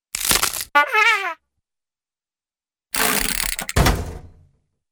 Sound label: Wooden Crunch & Trumpet Blurp Metal Ratchet Door Slam; Cartoon; Hollywood Edge; Cartoon Trax